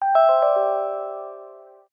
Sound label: Alert, ringtones; Lumia Ringtones